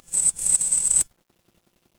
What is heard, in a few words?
animal insect